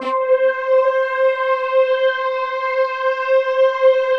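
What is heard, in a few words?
PAD, atmospheric pads; Best Service; XX Large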